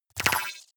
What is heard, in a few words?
deny click; maschinen; signale; soundpool